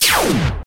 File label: Magic fire